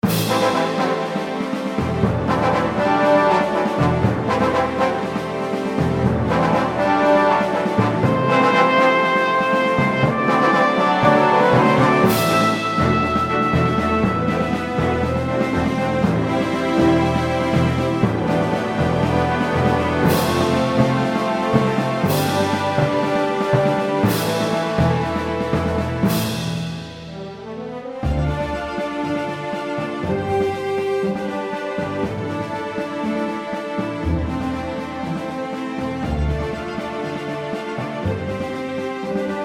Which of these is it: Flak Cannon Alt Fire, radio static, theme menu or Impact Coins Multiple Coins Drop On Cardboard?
theme menu